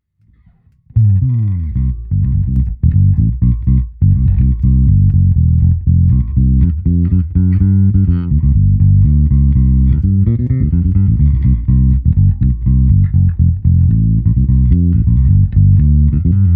Motown Style

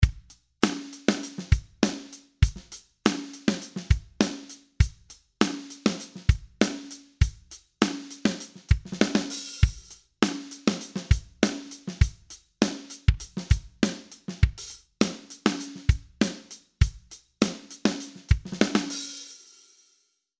Presonus SL3 Drums mixed

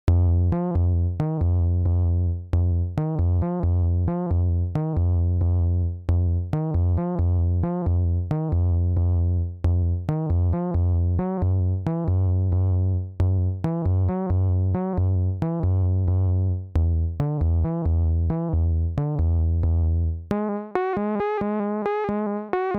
Industrial Bass

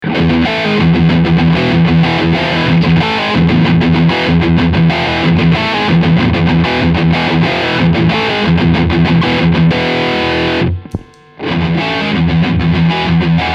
Metal Doubling

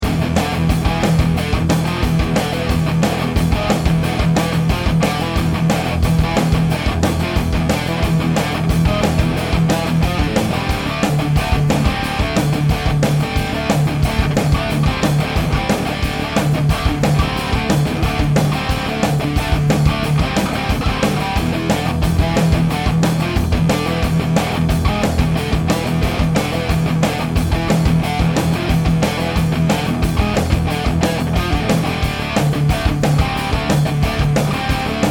Crunch

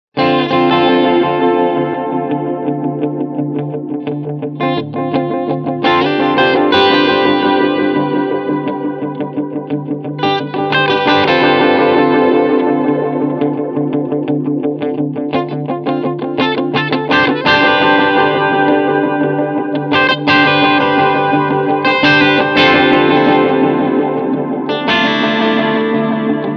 Head 400 ms

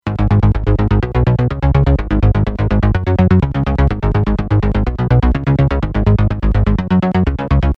Sonic Farm Xcalibur JC Synth Bass Original

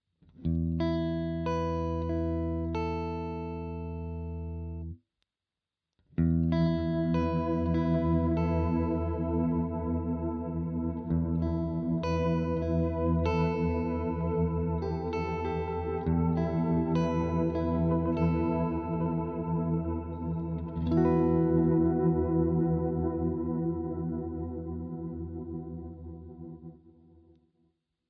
Mode IV Riff

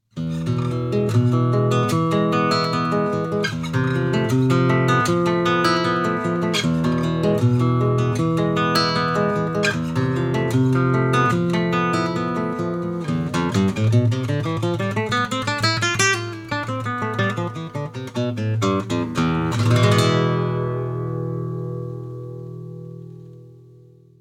Spanish

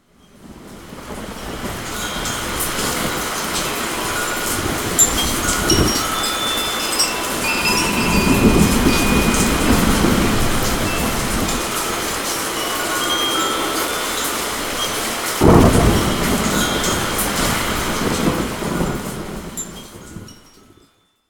Thunderstorm

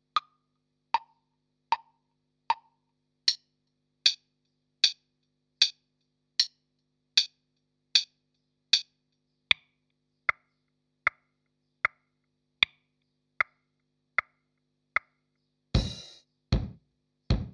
Digitech JamMan ClickTracks

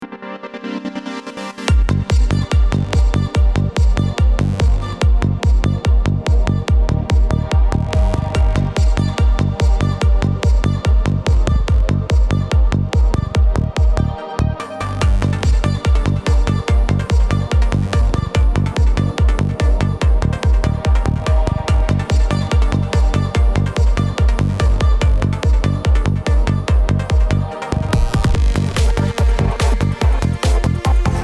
Psytrance ATTACK 7 RELEASE 5 20:1 100 Wet; GainRed 1 3

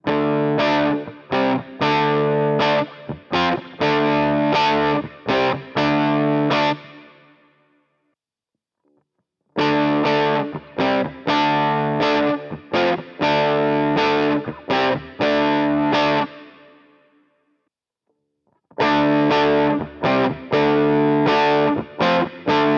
Harley Benton DNAfx GiT Vox EL34 EL84 6L6 6V6

